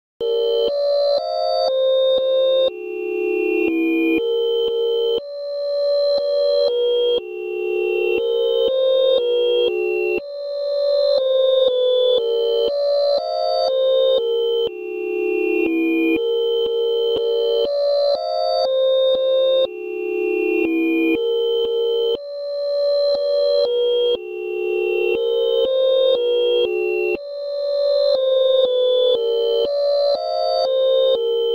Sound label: Music